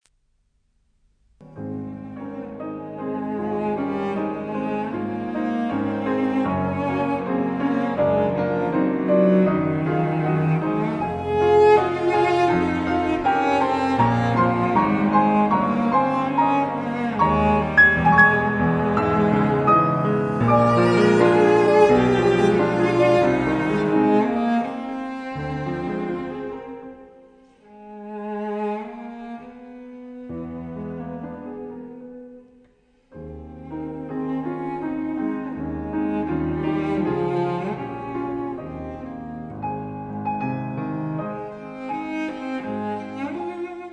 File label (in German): cellosonate; 3. satz